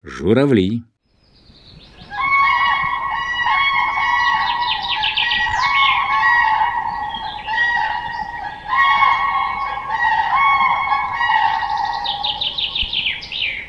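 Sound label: crane; Golosa ptic i zverei; Zhuravl'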